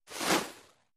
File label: Arrow Sizzle Bys Medi; bow & arrow bys